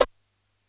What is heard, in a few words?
Cutting Noise, Yamaha PSR-E323 SFX Kit